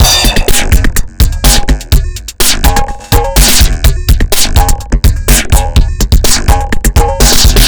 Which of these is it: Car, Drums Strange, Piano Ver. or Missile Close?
Drums Strange